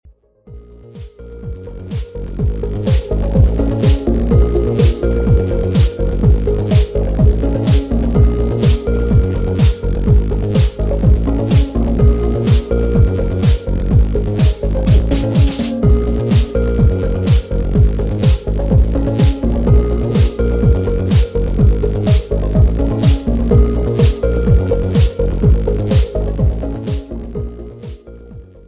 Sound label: Funk Remix